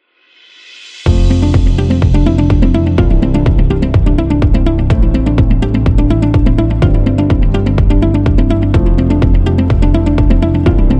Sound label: Background Soft